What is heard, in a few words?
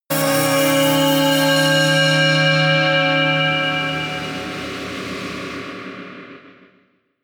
synth slice high, drones